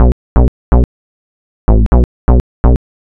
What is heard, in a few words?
Disco Fever